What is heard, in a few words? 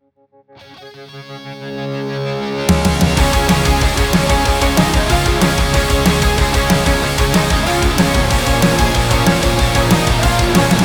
80s punk metal medley